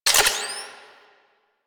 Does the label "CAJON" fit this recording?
No